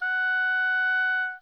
oboe mf F#5

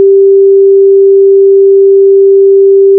Short Tone G

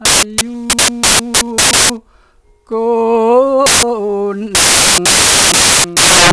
indo male

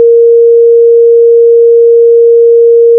Short Tone A#

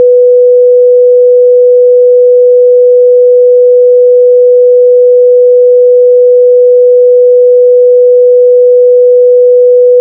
Tone B

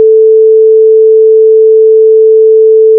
Short Tone A

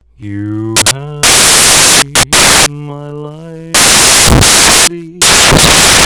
odd Even Harmonic Ratio transf

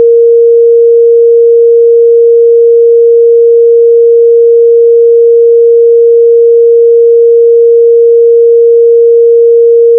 Tone A#

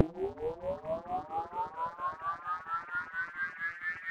out sweep

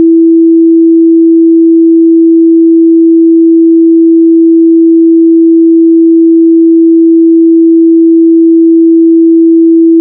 Tone E

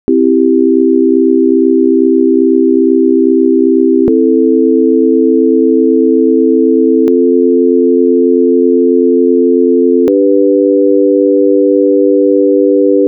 Chord Progression; After 2000ms

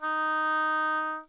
oboe mf D#4

